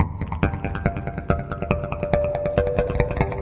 140 descending bass harmonic echoes

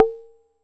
Jomox MBrane, drum machines; Jomox MBrane 11

